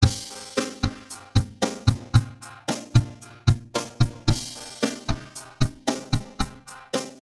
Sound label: AM strings nonstat